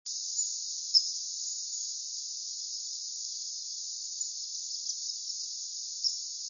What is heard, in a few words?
kinglet laugh, ruby crowned; birdsongs